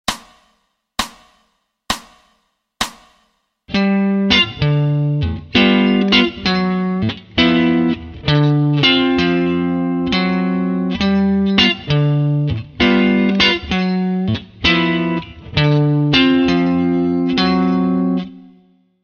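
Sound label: Walk Bass Lines slow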